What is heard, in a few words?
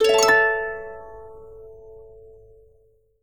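harp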